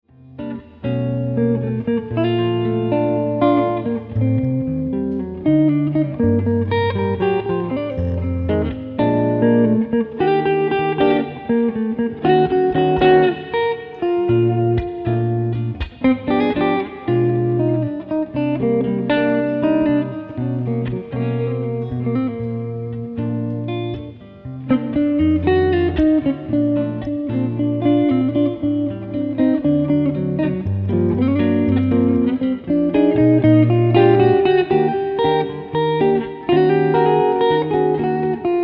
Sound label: Cloudy Bossa